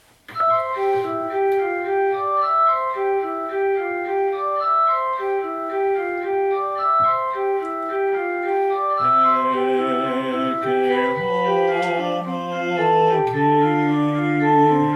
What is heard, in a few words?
Ecce Home Bass, Choir